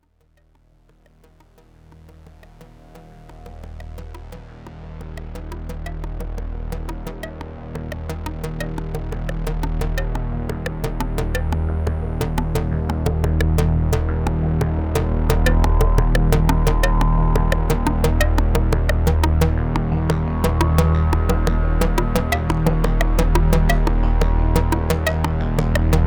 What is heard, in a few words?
Noise Warm Brass Mix, synthesizer; GRP A4